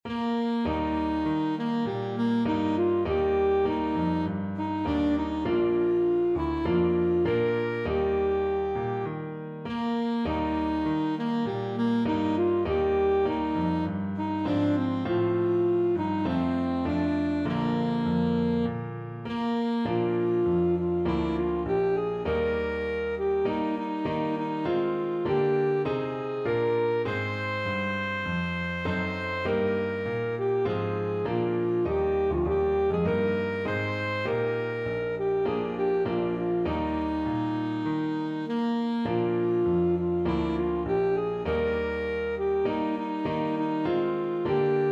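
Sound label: alto saxophone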